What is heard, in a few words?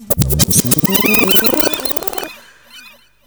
REVERS TAP; USB Soundscan vol.27